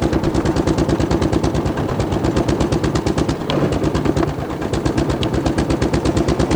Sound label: old sizaire engine